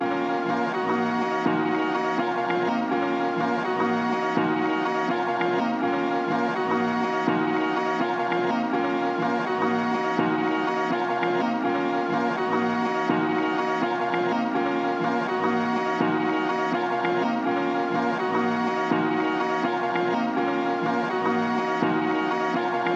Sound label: 165 BPM A# Min Main Melody, Loop Stems; Melodies; Cymatics; Domination; 2022 Melody Mystery Expansion